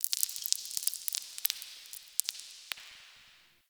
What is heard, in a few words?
Zariman Stone Debris; Warframe; The New War; Ambience